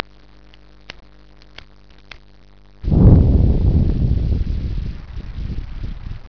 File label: LightUp, No Smoking Theme